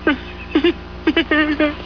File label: LAUGH; (Another goofy laugh); Caddyshack II